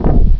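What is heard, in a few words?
door close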